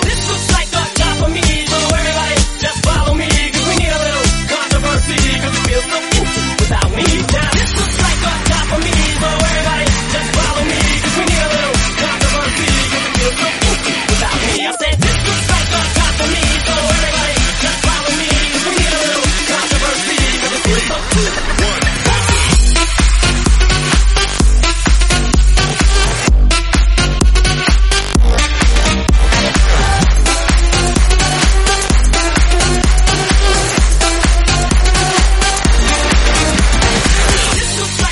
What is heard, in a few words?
128 bpm Gm